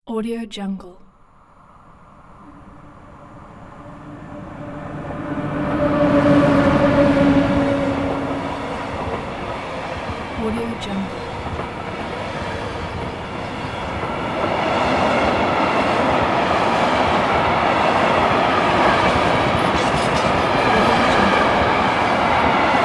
long cargo train close passby; fxprosound

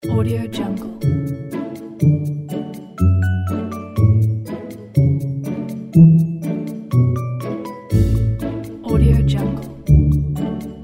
positive spring mood

